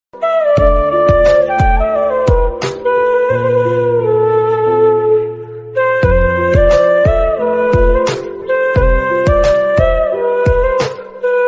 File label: music ringtone